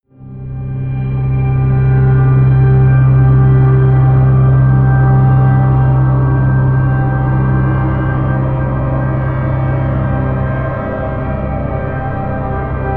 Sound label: drone gates